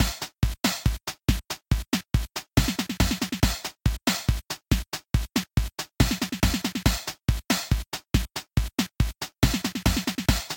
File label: Birdy Drum; Wonder Wickets Windows gamerip 2018